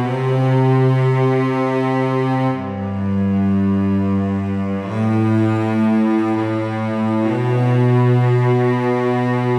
Marching Cello, метал

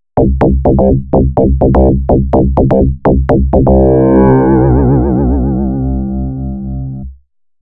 Bass, бас сэмпл дэнс электро

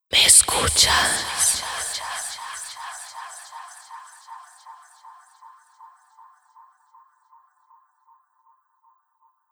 Escucha A, сэмпл вокала эмбиент

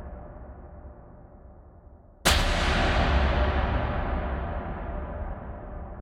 эмбиент; Oblivion

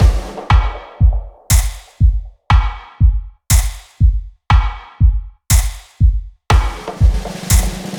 Major Kit, сэмплы ударных electro dub

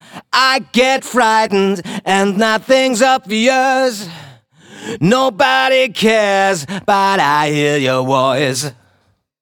звук вокала рок поп

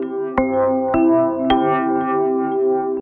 Rusty, сэмплы клавишных эмбиент

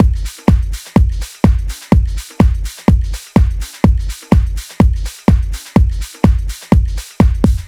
Kicker C, диско хаус

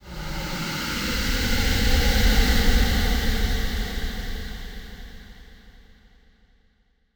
Floating, звуковой эффект в стиле electro dub

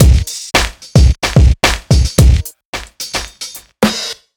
ударные и барабаны rnb soul; Bombastic

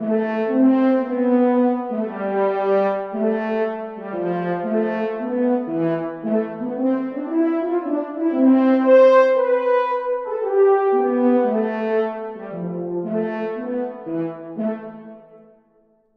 horns, средневековая мелодия

